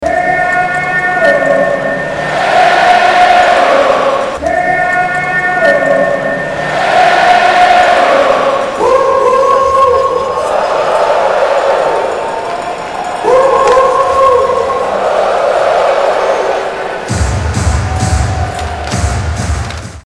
HEE HOO HEE HOO WHOOHOO

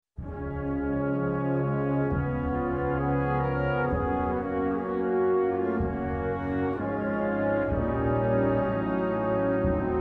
Hungary National Anthem Himnusz Instrumental; Hungaria

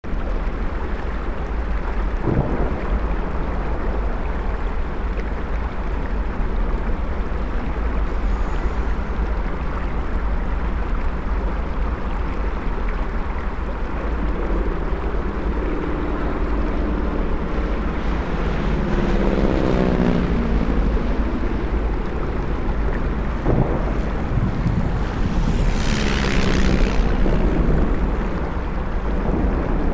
Amb Bridge

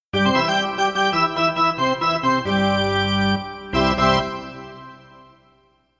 Arena Organ

